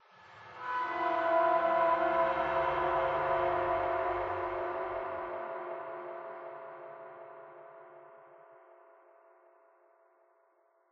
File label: Interior Metal; The Sinking City